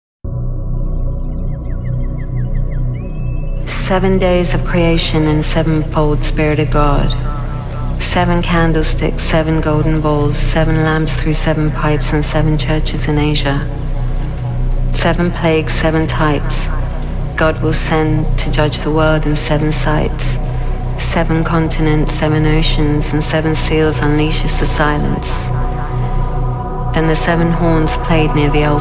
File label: TRUMPET